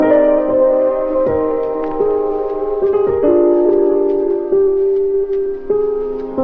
vibes, Sad

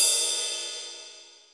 Cymbals

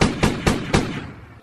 gun shots; Crash Bang Boom

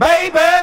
"baby" SUNG

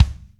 pista, Kicks Medium

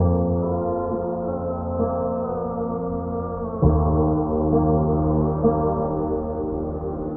piano and voice, Sad Loops

